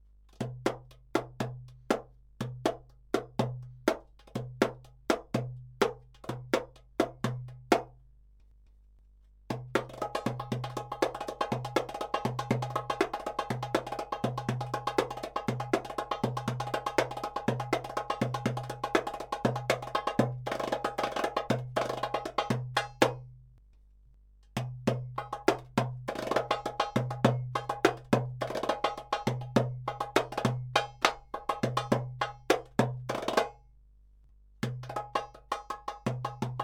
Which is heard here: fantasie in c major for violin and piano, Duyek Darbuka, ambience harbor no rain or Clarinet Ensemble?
Duyek Darbuka